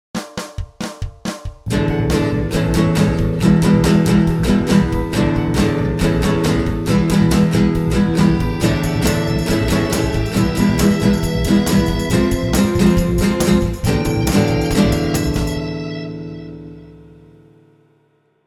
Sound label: gtrrrr jingle